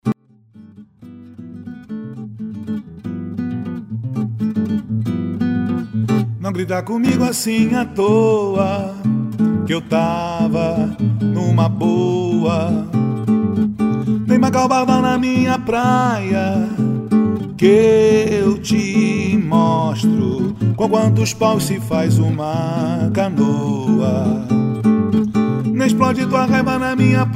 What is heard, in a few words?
viola